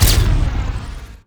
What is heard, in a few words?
burst beam